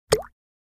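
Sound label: Drip Single Falls Liquid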